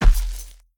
Footsteps Dirt Walk; Devour; The Town

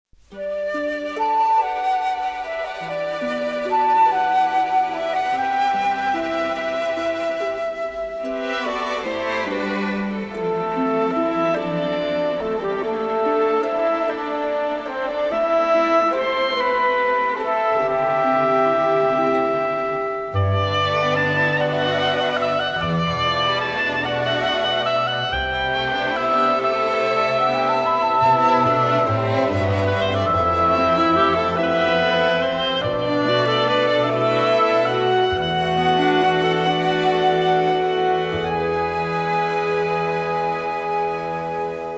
Rippling Waves; Nobunaga's Ambition Rise to Power; PS2

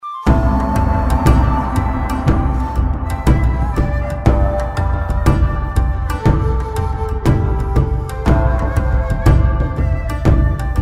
bgm login; Ghostsoul Online

